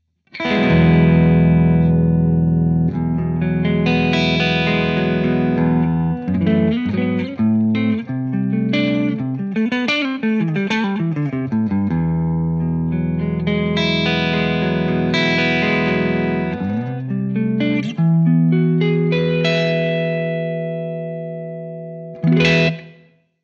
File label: characteristic sound; jensentone